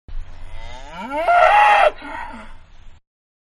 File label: Cow Mooing Loudly; Cow-Moo-Loud; Cows; Animals; Manhattan Production Music